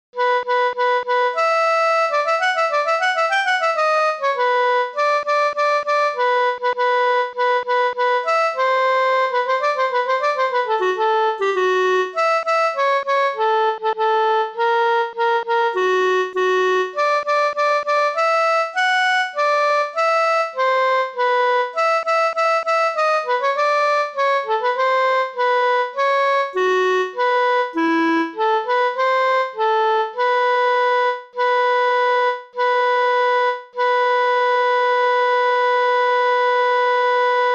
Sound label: Tenor; Vivaldi GLORIA